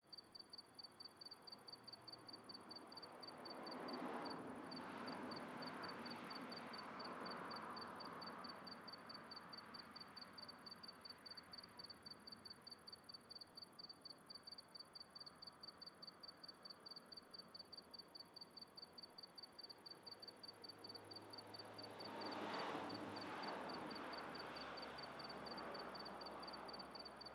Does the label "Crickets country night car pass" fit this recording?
Yes